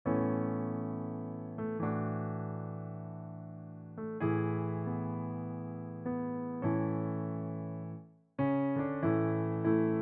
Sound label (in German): jeder tag piano